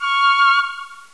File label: pipe